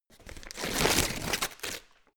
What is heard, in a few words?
tear thin paper